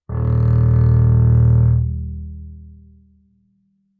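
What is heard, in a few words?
Bass arco fortissimo sul C Eb1, Double Bass; MIS Pitches - 2014; Strings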